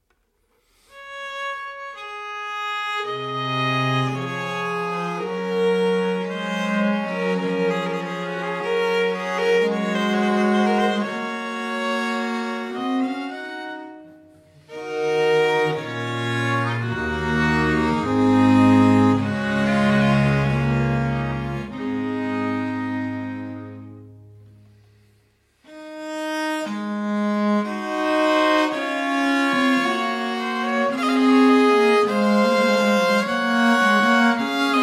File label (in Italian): quartetto in re maggiore largo